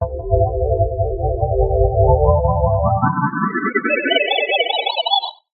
synth space weird synthy sci fi power up long wobble; Jessey Drake